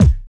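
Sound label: Hip Hop R n B bass drum; USB Soundscan vol.19; AKAI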